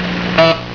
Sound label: buzzer